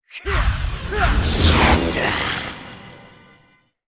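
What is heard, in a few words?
sorcerer attack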